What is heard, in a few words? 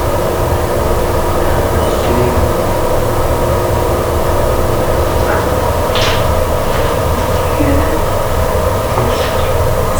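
Unexplained Knock and Popping Noise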